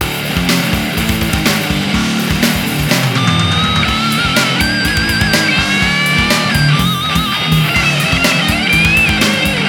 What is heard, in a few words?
metal riff